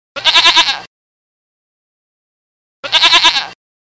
funny goat; animals

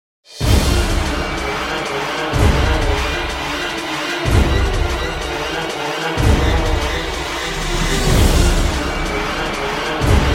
Battle; Vampire

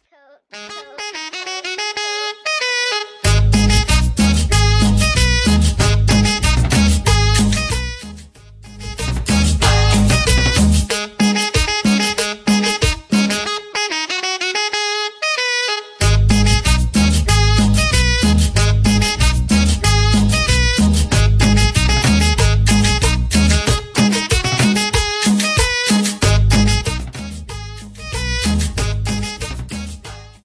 cumbia transition

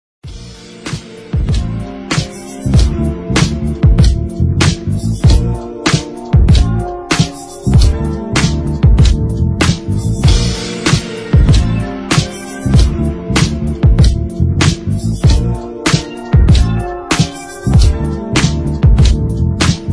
sexy g funk beat

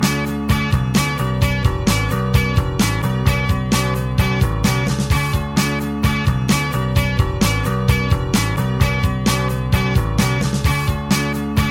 Soothing Alarm

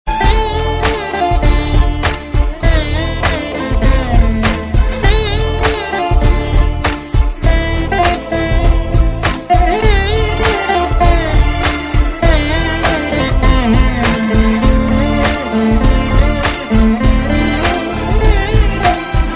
music telugu

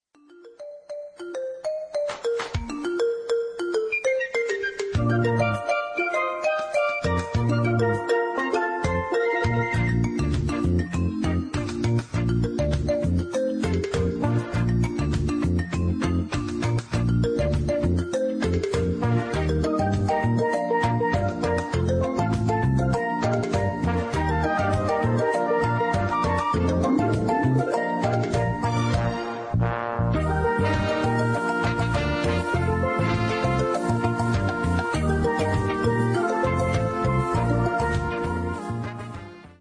instrumental; little mermaid

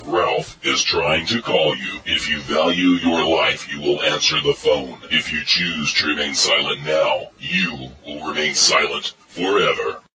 Acoustic

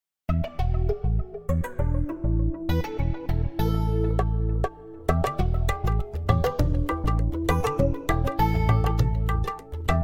nokia bollywood

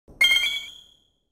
Shiny Sparkle

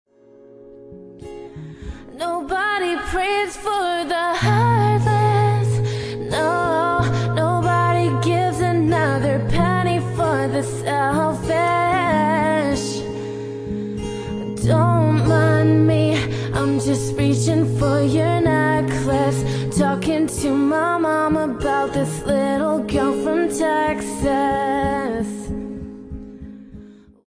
acoustic cover

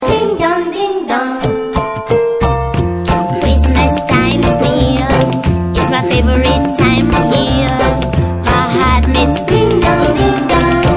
Jumbo Ding Dg

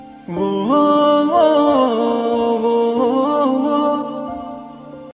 Oooo Oooo Ooooo

